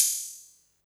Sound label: HH, 160 BPM, Drum & Bass; Ueberschall; Drum & Bass Flash Trackz